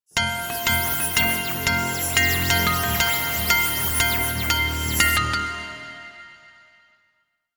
Scifi Audio Logo Ident News Update Data Ticker Radio; Lynne Music